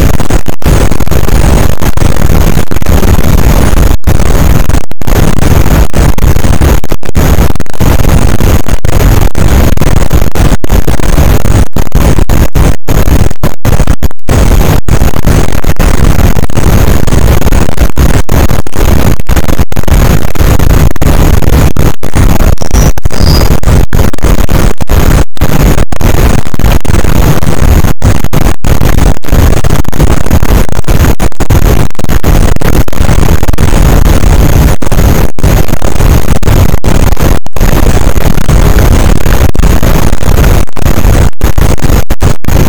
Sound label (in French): cachalot positif, boat; Physeter Macrocephalus